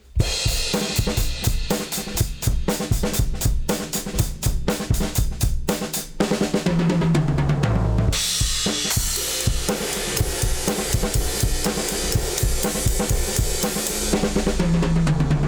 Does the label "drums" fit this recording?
Yes